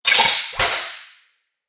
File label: Robot Factory Noises; Robot Sound Effects